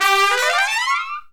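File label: brass trumpet; Roland L-CDX-03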